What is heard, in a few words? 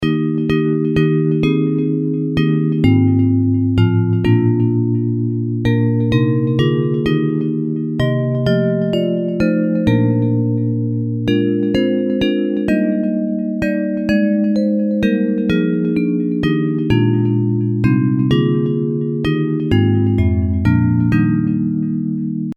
Bells